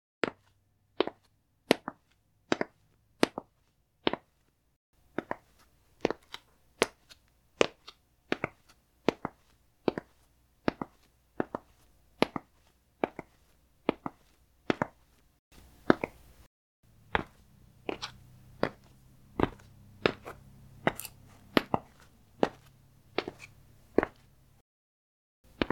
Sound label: Male Leather Sole Shoes Slow Walking Footstep Sound